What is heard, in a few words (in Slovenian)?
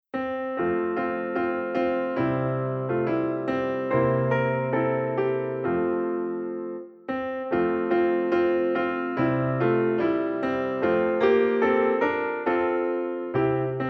jesensko listje klavir